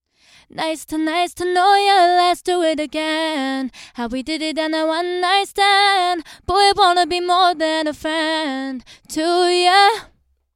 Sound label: female vocals; lewitt